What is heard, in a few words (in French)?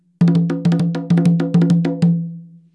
frise, congas